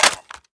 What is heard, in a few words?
mac10 mag in